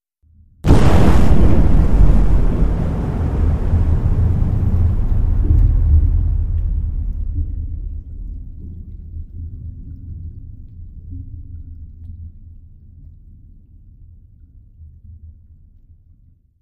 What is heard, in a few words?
Underwater Explo, explosions